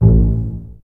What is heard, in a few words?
BSS A 1 Pizz, String Ensembles Basses; Miroslav Vitous